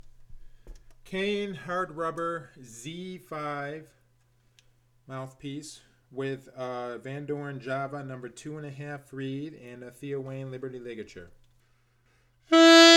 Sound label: Z5 ALTO; Kanee